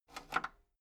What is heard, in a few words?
metal lever button radio disable slow